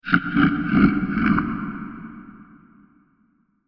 Laughter Of The Slime Monster, monster sound effect; Monster Sound Effects